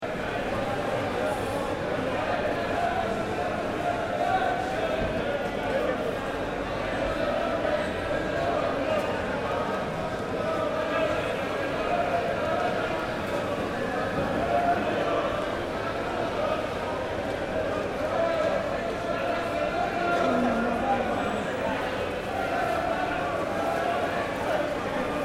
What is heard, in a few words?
Fish Market Crowd Long, Heavy crowd walla in large indoor hall; People chatting and shouting, excited crowd, can also be sports are